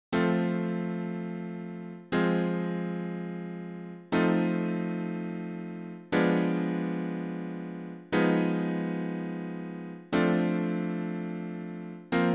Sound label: chord F